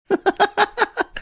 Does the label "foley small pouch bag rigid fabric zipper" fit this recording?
No